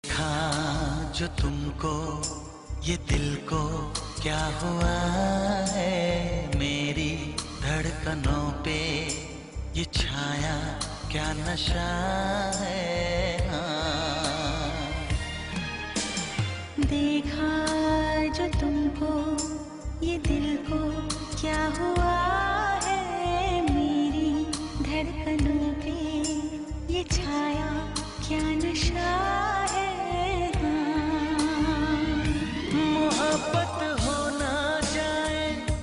ringtone

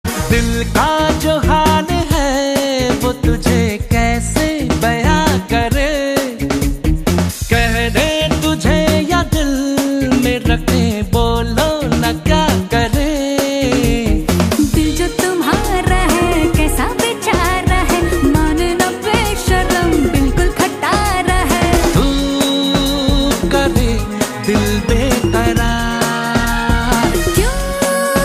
ringtone

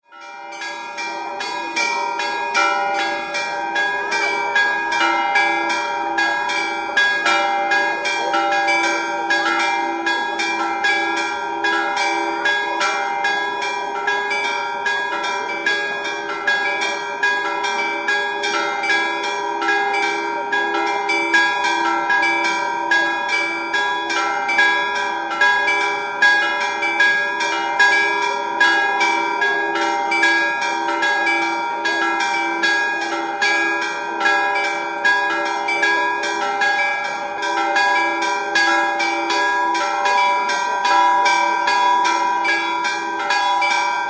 church bells ringing

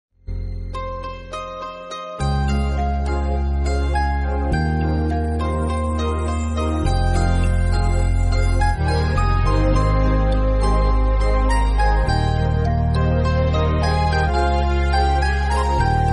Instrumental music

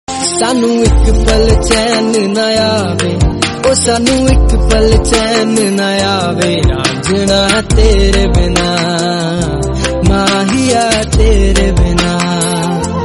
Punjabi

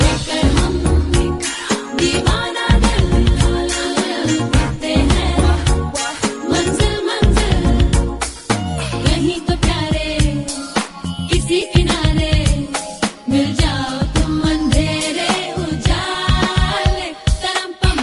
remix

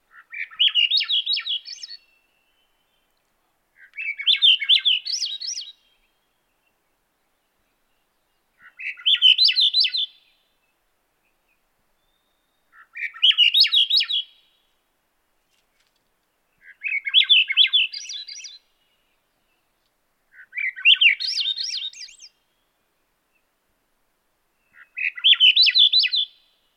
swainsons thrush

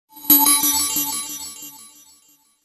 htc touch 3g facet, message tone

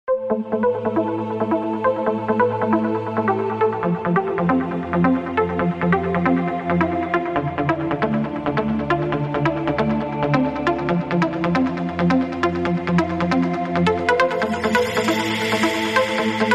trance best

